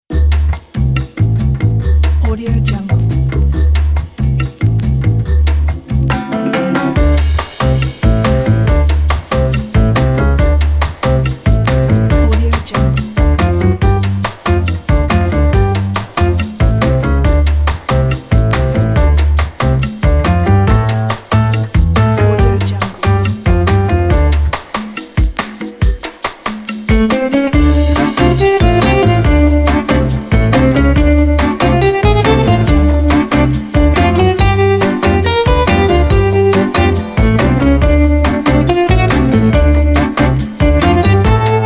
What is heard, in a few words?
vintage jazz